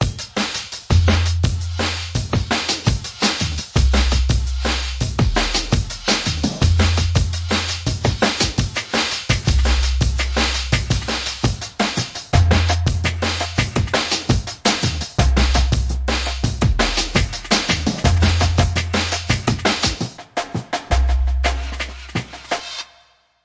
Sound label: drums, DnB; 168 string n piano DnB song; REMIXABLE COLLECTIONS